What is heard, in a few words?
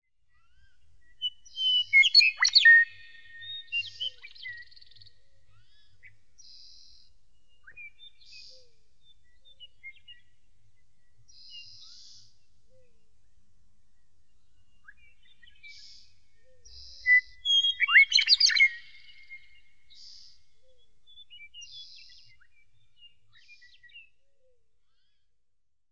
Western Meadowlark